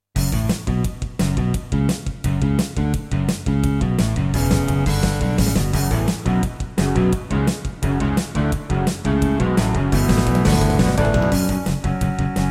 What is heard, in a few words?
Yamaha DTXpress; Duke Nukem 3D